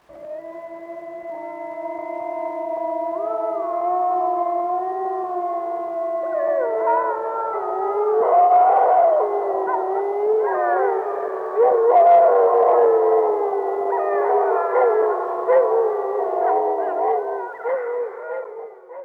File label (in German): Wolf Canis lupus Chorheulen; Karl-Heinz Frommolt